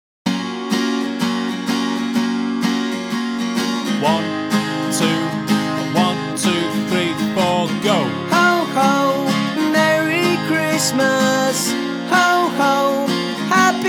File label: Christmas Cheer Backing track